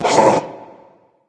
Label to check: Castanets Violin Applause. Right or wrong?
Wrong